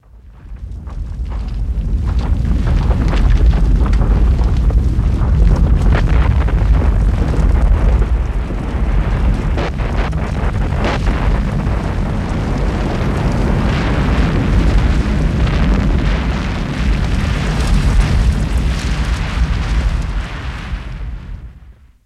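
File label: Earthquake Long Avalanche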